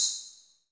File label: HH VERB